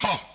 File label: Moombah Vocal; Moombah Kit; Hip Hop; STYLE KITS (Hip Hop, Etc)